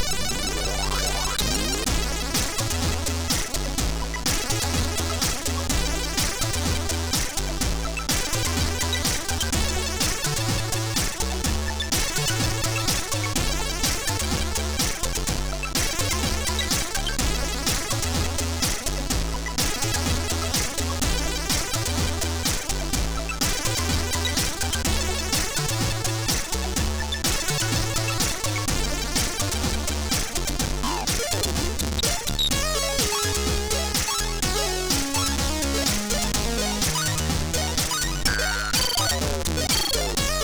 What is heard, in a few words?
Old Radio, MOS6581R2; Electric Starbounce